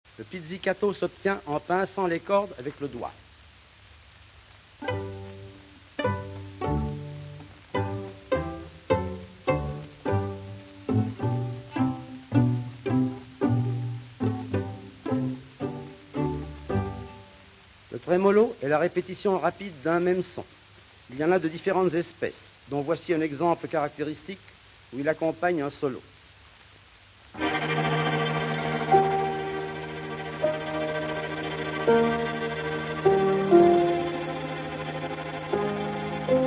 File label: orch Pizz Trem Acc